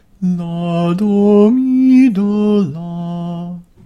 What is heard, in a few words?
Minor Triad